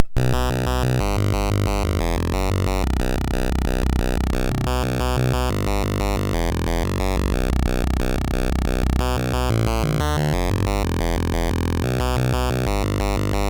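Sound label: Mad Planets, MOS 6581 SID chip music, games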